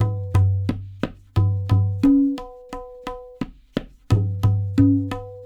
LOOPS, Percussionworks

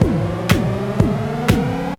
FX LOOPS

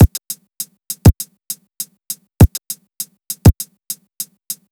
FK BEAT, FUNK

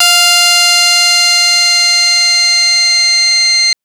Synth FM 2 Op Basic 3 c3

